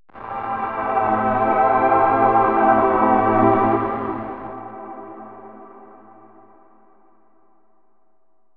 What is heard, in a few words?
Tonal FX Am7 70 Bpm, Granular Tonal FX, Lo-Fi Kit; HipHop; Ghosthack; Advent Calendar